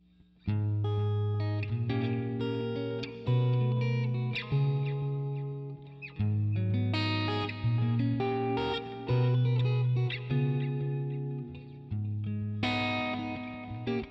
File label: neck-middle, pickups